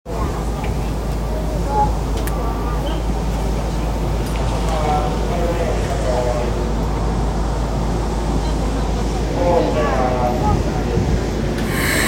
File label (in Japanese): 京急空港線糀谷東